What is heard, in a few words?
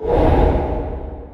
wing; biblically accurate angel